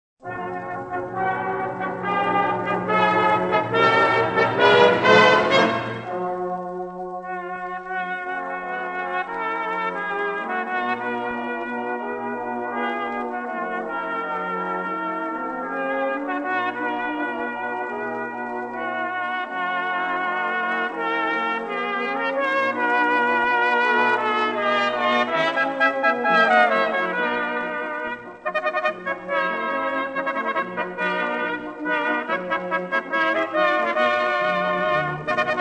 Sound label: CORNET DUET; THE CLEANSING STREAM